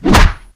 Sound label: swing hit